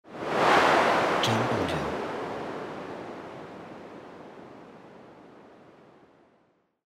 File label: Whoosh